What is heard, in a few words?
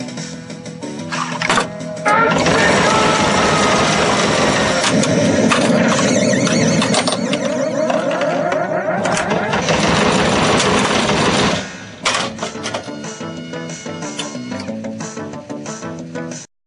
Earthshaker close up; arcade; IE enginearing